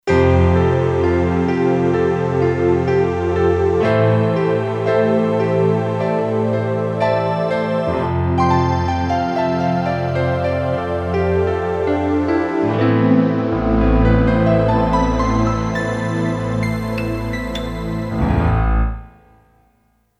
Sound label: Grand & Pad; Factory Programs